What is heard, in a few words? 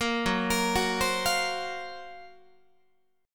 Gb Major 7th Flat 5th Guitar